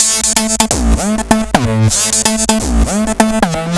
Synths, Underground UK House & Electro

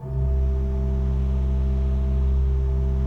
C1, Cathedral Organ; ILIO; Propeller Islands Cathedral Organ

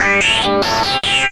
GIT LP, Drum & Bass, 145 BPM; Ueberschall; Drum & Bass Flash Trackz

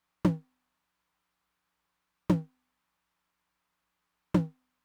mid tom with, Roland TR-909; Roland TR-909 Ableton Project

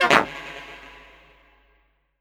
SAX, 150 BPM; Ueberschall; Drum & Bass Resonance

